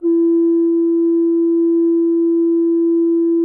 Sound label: BAS F2, GEMSHORN; SoundBytes - Early Patches